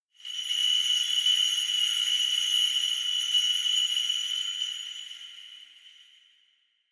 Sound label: Kagura Suzu